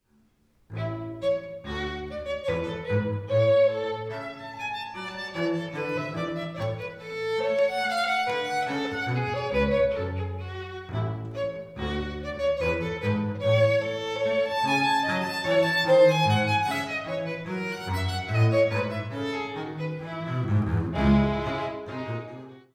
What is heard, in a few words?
Gavotte duo